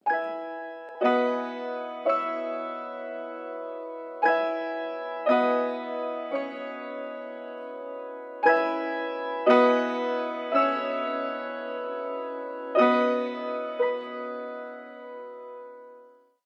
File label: Early twilight, alarms; Android P